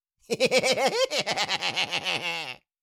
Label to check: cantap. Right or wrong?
Wrong